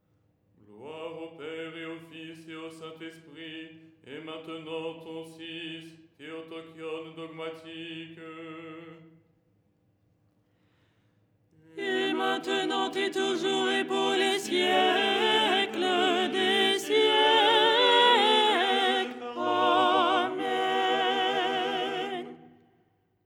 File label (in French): soprano; " Gloire… et maintenant… "; Les 8 tons en français; ton 6